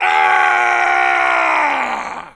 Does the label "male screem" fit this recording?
Yes